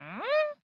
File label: fun monster cheeky sneaky find object; fvtt sonniss gdc audio